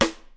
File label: dry steel snare drum sample e key